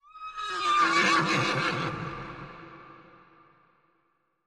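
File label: Animal Stinger Eerie; stingers & whooshes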